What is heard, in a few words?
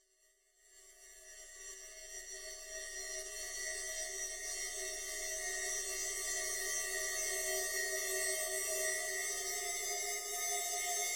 Waveguide vocal tract chorusing